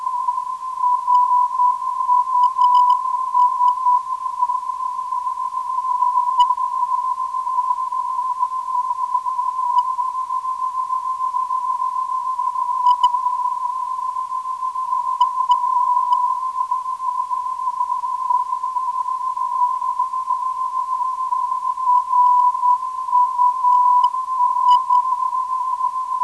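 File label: 424kHz, non-directional beacon; RUS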